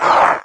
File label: pain, revanent; doom3